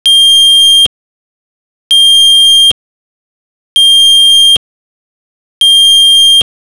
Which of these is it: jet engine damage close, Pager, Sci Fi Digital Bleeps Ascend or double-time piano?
Pager